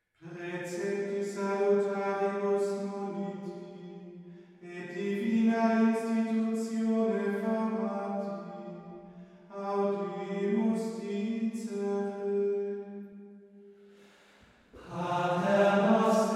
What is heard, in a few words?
Ordo Missae in Lingua Latina Pater noste, Chant